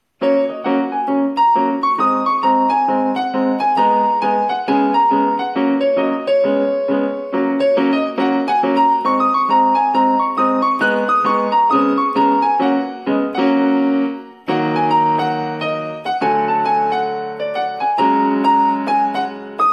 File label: Piano improvisation